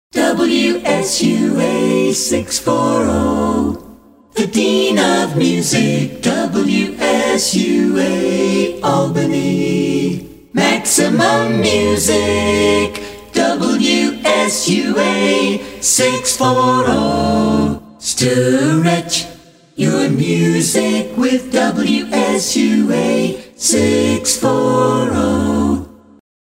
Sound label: jingles mix; wsua